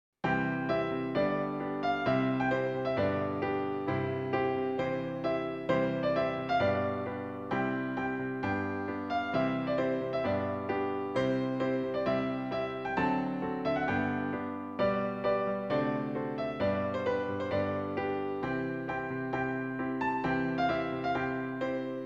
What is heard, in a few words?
Piano March